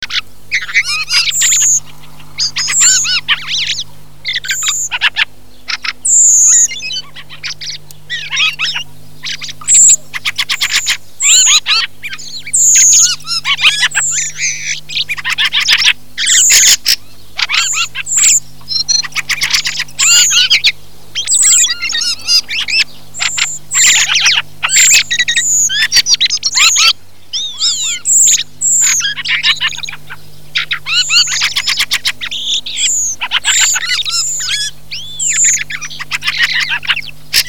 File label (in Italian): CESENA ZIRLO; CANTI SAG NATURE 2024